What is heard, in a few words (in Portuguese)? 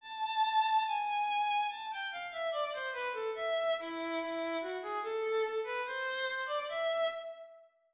Concerto para Violino; Vivaldi